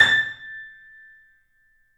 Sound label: The Piano; InVision Interactive - The Piano